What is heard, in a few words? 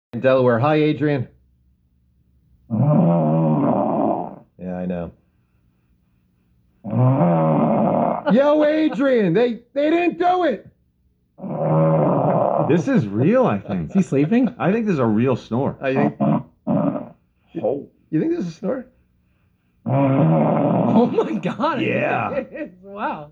snoring, radio; radio snores some guy fell asleep waiting to talk to wip morning show